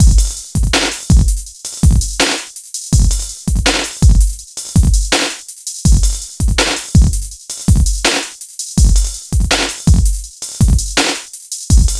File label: elec drums massive detune